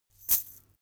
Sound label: chain mail march single left